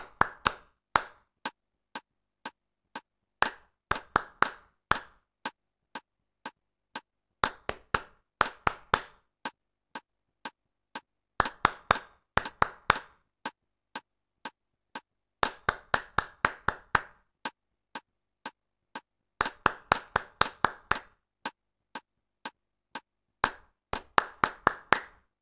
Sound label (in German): Warm klatschen; Der Rhythmus-Globetrotter